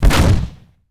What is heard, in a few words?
Creature Footstep; Haunted Bloodlines; SFX Producer